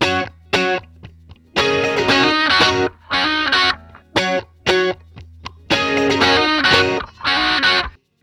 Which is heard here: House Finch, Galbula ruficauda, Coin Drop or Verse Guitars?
Verse Guitars